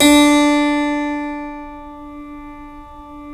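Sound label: D3 clavinet left, Keyboards Acoustic; USB Soundscan vol.17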